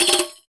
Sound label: Rek Trill; Roland BK-5; Percussion